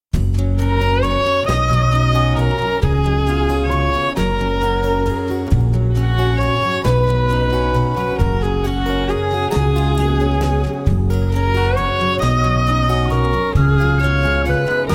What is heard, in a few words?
Acoustic; putumayo